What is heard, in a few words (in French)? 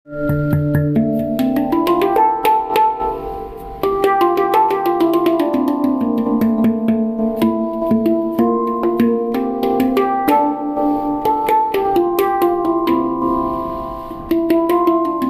handpan 9 notes; dore